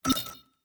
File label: Menu Inspector Window Open; Custom UI Sounds; Call of Duty Black Ops Cold War